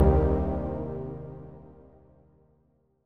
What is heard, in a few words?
lfo dry